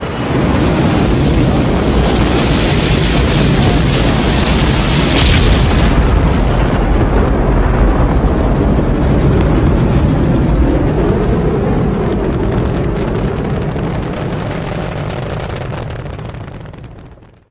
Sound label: rocket away; sadpuppy